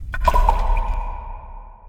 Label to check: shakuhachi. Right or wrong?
Wrong